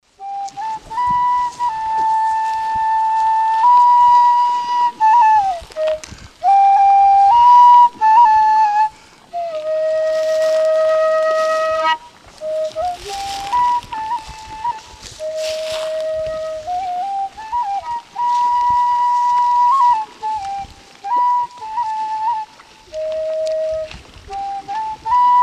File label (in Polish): piedsn dudka; borowa